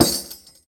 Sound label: Tamorra, Roland BK-5 Percussion